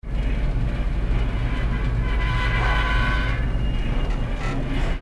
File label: Elevator (loop) short; Phasmophobia